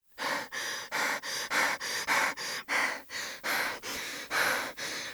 Rapid Breathing

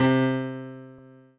Piano Ff

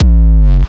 Bass Drum Polygonal Goomba

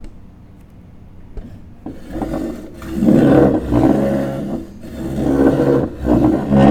Chair

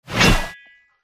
Axe Throwing

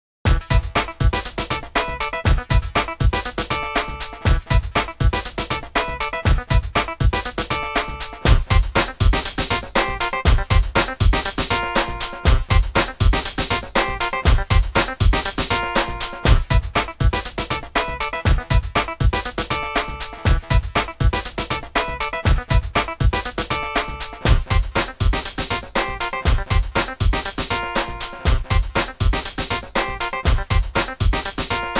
Funky Drum And Bass Loop

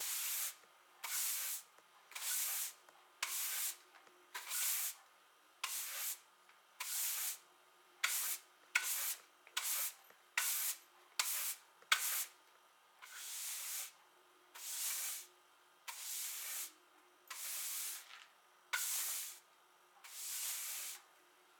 Broom Sweeping

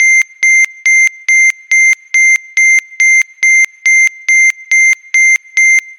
Beeping

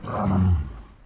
Low Vox Grumble

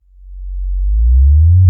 Reverse Bass

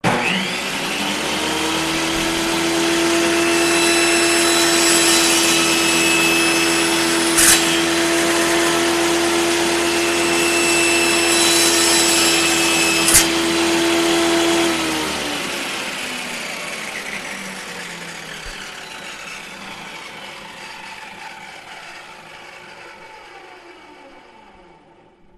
Table Saw Circular Saw

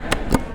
Elevator Button

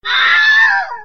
Female Wilhelm Scream; Audacity

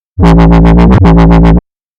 Sick Wobble

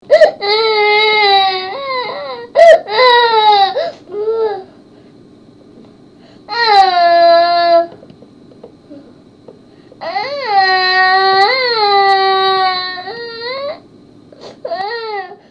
Baby Crying

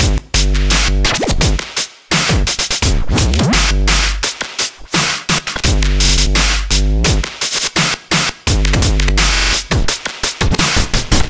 Dubstep Remix Drumloop Drumstep 170

